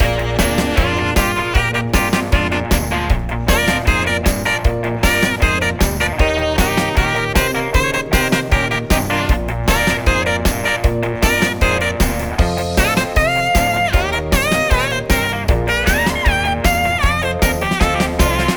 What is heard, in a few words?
vamp